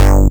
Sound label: Bass Cuts; Vengeance Samples; VES1 Instruments